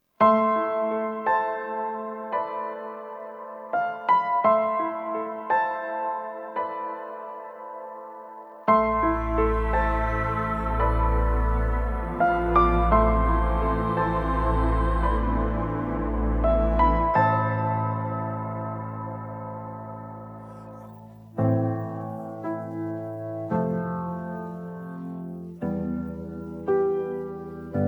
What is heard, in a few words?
Piano Version